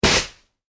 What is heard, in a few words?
household bean bag drop; Bean Bag Hits Floor; Drops